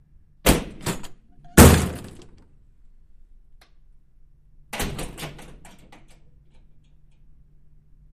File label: Door Push Bar Wood Do